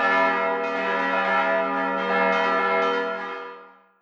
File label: symbol win scatter